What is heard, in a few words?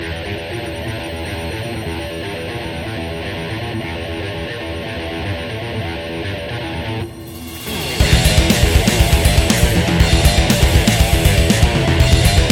guitar space noise